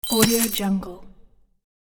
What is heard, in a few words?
pouch of magic gold